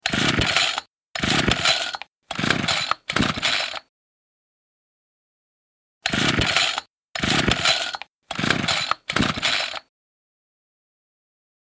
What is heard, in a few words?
Cannot Start Engine